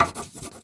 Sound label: Photo Crayon Env Scatter